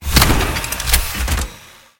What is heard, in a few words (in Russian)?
grohot metallicheskih ostrih predmetov